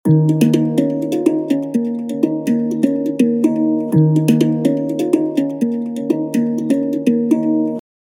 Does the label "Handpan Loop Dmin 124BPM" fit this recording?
Yes